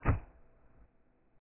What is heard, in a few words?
Dropping Books; Learn to Fly 2